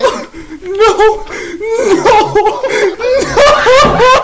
cry

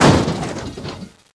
car crash

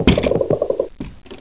door stop

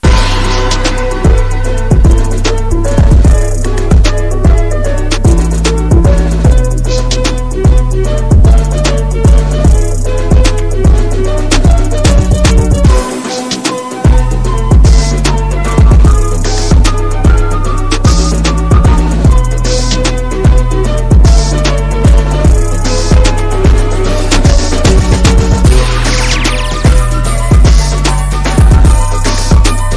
drip car

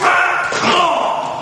moan

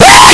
huegh

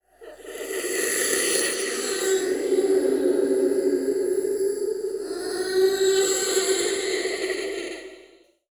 crying

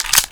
clip in, weapons USP

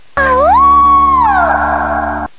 awoo

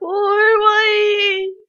die; w00tguy; stealth

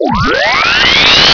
shriek; probe

